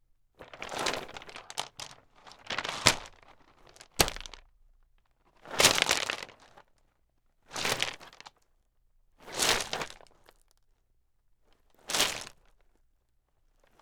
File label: Pebbles Stomp Sudden Slips Spins Turns Slides, Footsteps; Foley; Foley Essentials